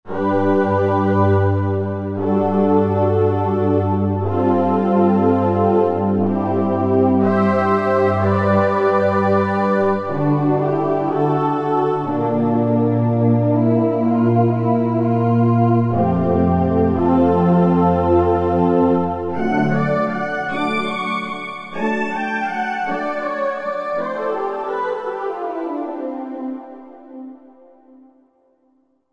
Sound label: Pulse Pad; Roland JV-2080